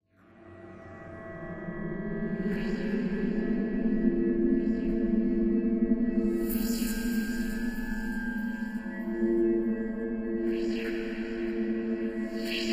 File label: Horror Drone Bed Metallic Swirls; Scary Tones; Blastwave